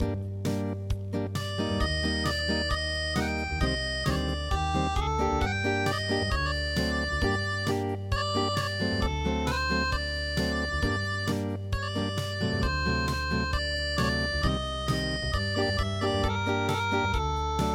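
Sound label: oedo nihonbashi unplugged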